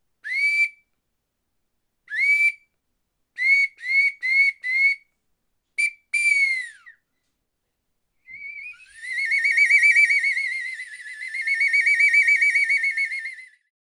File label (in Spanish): Ocarina pájaro